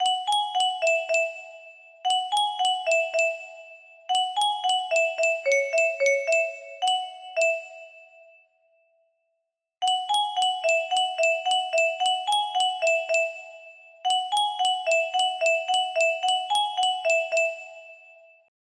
melody stuck in head from old videogame, music box melody